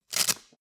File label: velcro undo roomy short